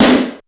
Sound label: SD BIG, snare drum; SNAREDRUMS